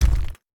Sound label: Rock Boulder Hit